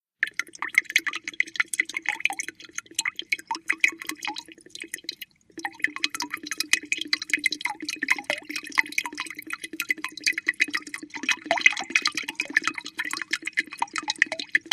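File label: Drip Fast Leak Heavy; drips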